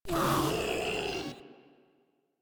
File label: Vocal Pain; Warframe; Proto Infested Ancient